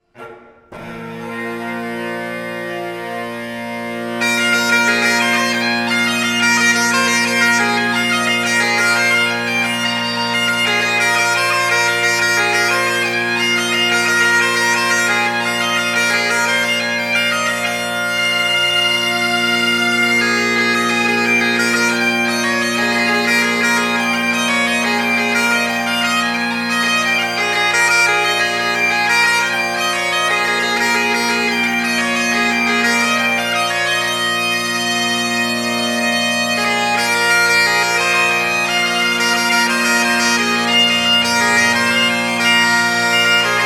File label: Irregular Jig